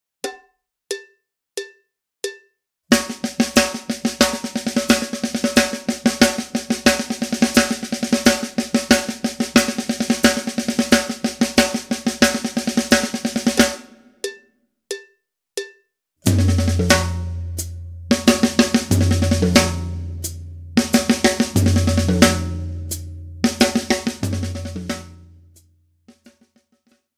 Vokabel-Mix; Schwarzes Album; Bum Bum Tschack Grooves Fills

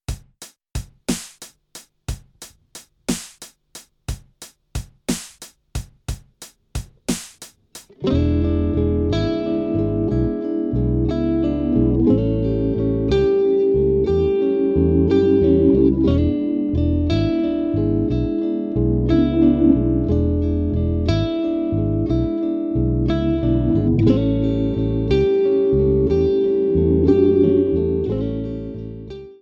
Triolen Feel; Triolen FATS-Feel; Bum Bum Tschack 1

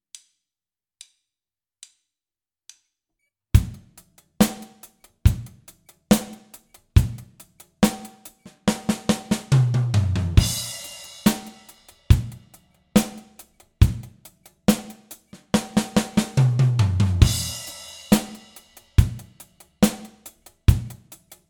1-2-taktige Fills; Bum Bum Tschack 1

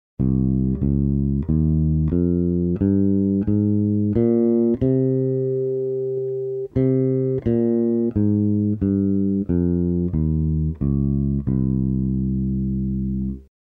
Phrygisch, Bass; Bass Unlimited

